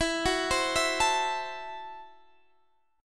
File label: Gb Minor 7th Flat 5th, Guitar